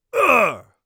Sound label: hit, Warrior Voice; Warrior Voice Pack